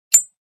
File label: Grenade safety pin pull; Military Equipment